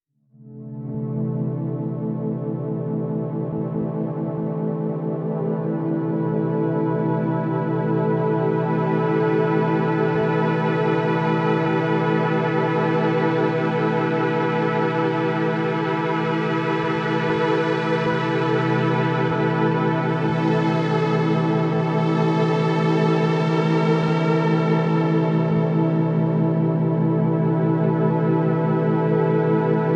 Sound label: Sawpressive